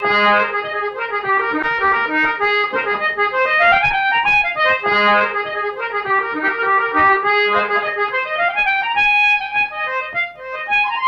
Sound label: Irish Concertina; Five Mile Chase